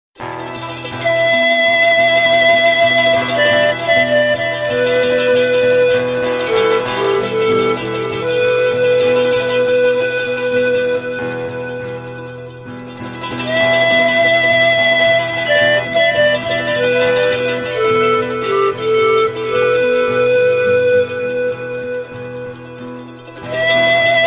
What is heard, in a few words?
Ballad